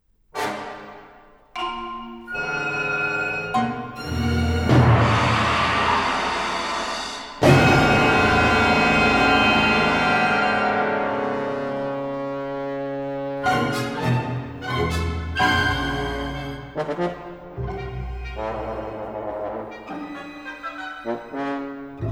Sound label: horn concerto